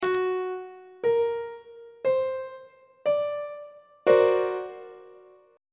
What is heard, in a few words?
e double flat augmented 7th 1st inversion on treble clef